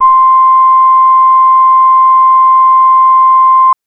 Synth VoiceOh c5; TrashLibrary

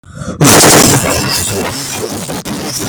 Growl; WRY; M8; random and out of place

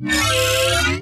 weeee

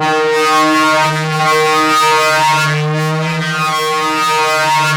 FLYER D#2; Music Store; 90's Sample CDs

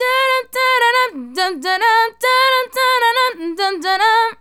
Female Solo, Hip-Hop and RnB Vocals

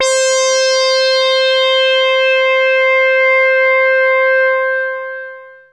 Analogue hits and octaves; FM 57 - The Ultimate Sample Collection (1997)

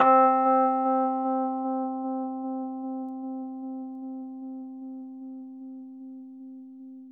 Rhodes 73 Slow, C; E-MU Producer Series Vol. 5 – 3-D Audio Collection; 3DRhodes

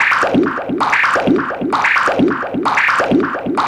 NRG SFX, Trance

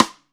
TAMA RIM; Best Service; Real Mega Drums VOL-1; WHGM KIT 4

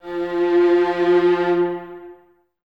STR, String Ensembles, Violas; Miroslav Vitous; LINKS ORCH

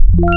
Additive Octaves, wavetables; Massive 2-Basic

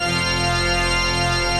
PIPE ORGAN

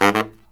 Killer Brass Riffs

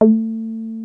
303 A3, TB 303

